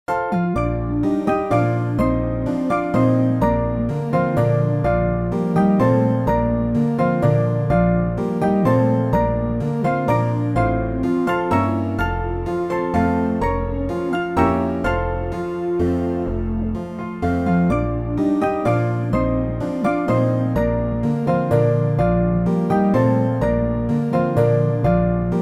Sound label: waltz; Light2Art